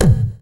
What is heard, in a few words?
ZAP KICK, Techno Trance; E-MU Formula 4000 Series Vol. 2; OrbitPercMaster